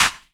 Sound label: Claps & Snares